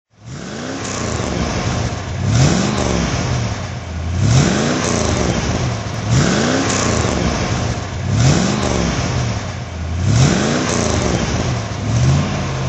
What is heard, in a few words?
facel vega facel2 throttle